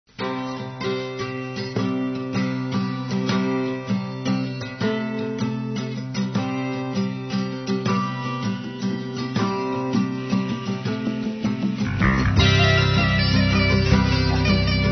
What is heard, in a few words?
Instrumental; RKC Live Stream